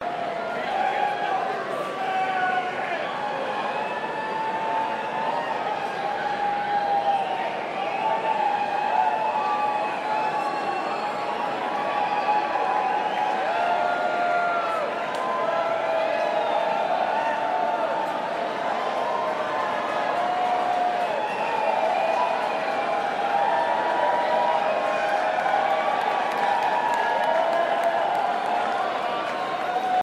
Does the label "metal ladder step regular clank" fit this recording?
No